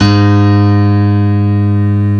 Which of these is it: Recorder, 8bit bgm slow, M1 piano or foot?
M1 piano